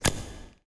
Lock; Coolpad; Mega-2.5D

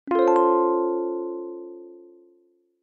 Jazz alarm; Nokia; N78; Audio themes

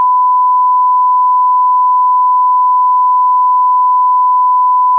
1KHz; Samsung SGH-i200

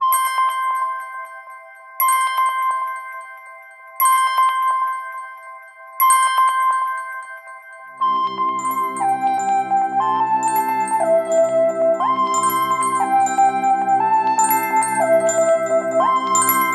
Warm morning; Sony Ericsson W705 Prototype; ringtones

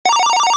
Ringer, BlackBerry; OS-4.6

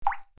Water close; Nokia; audio themes

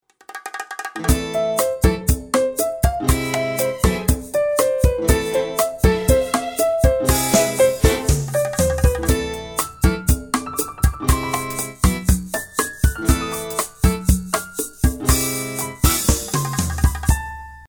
ring default, ringtones

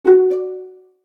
Pizzicato, notifications; Samsung; Galaxy J4 Core

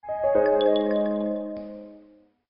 shutdown, ui

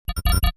Quake, notification; Tecno Pova 7

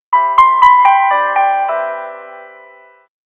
Transparent piano, notifications; Samsung; Galaxy Dart VTR

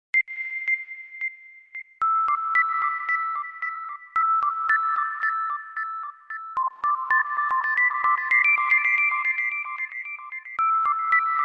Sinus Beep, ringtones; Sony Ericsson

